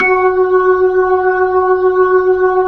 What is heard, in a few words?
B-3, Jazz